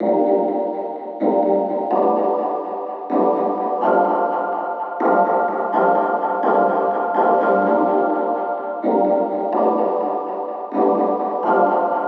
Synth Melody 125bpm G house retro

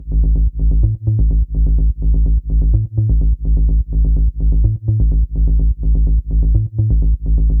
A Bass Loop, 126

